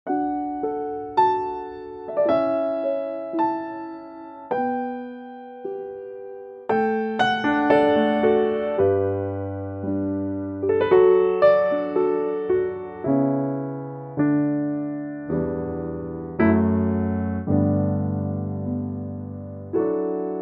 D piano

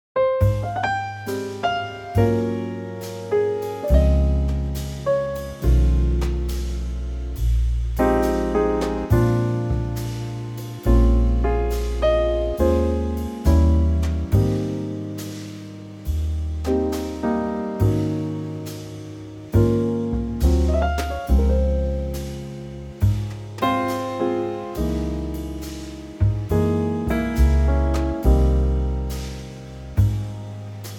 Ab Trio; key - Ab - vocal range - Ab to G

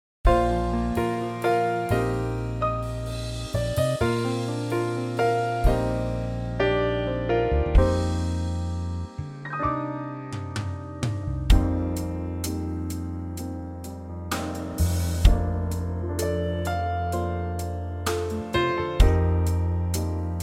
Bb trio

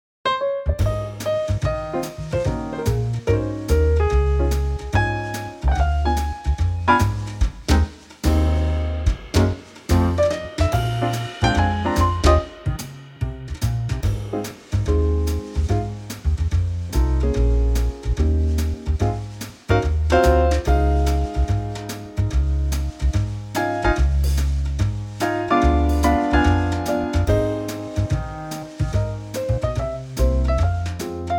RAGTIME BAND Ab; key - Ab; vocal range - Eb to Ab; Trio arrangement at a great tempo

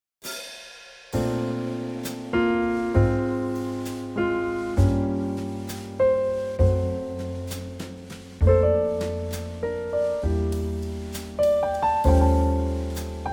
Bb Trio

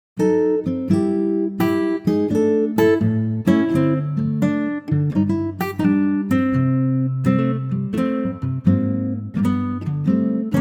C to Db guitar

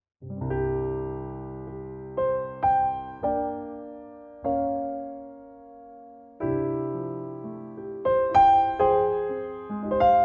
C piano

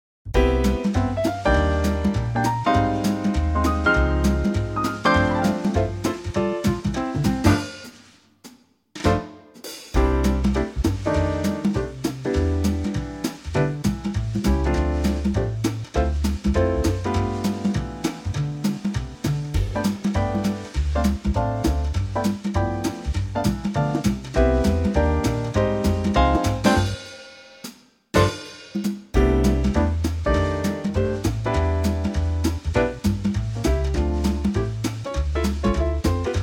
C Trio

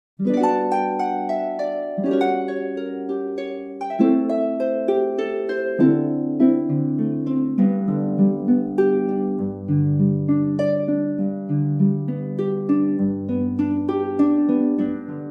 harp G

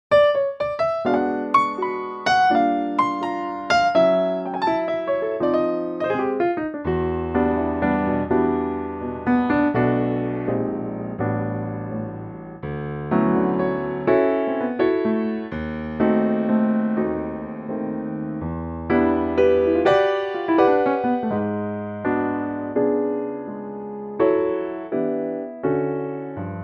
D Piano; key - D - vocal range - G# to B; Piano only arrangement of this wonderful standard and at great tempo.

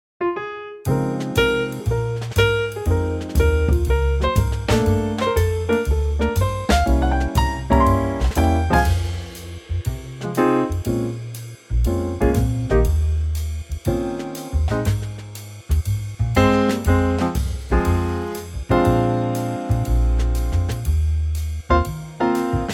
swing; Fm; key - Fm (Ab) - range - Ab to Bb; Swinging Trio arrangement

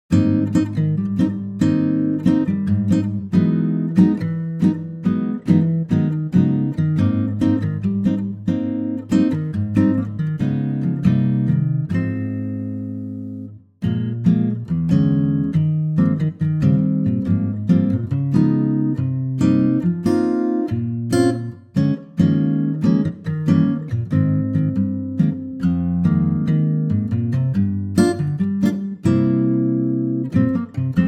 acoustic guitar Ab; key - Ab; vocal range - Eb to Ab; Stunning Latin arrangement of this wonderful old standard, a gorgeous acoustic guitar

